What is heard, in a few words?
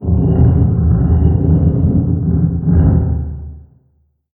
Random Rock